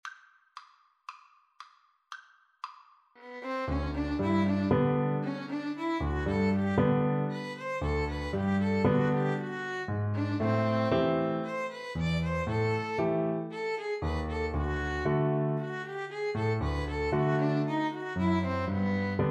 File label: violin cello, Violin-Cello Duet